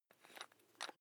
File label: electric drill bit fasten wrench turn medium